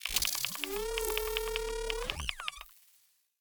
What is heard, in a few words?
Scanning; Game rip; MiSide